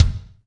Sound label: BD, BD REAL